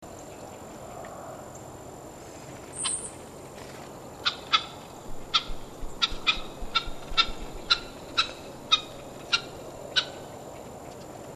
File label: golden fronted woodpecker, birdsongs, belize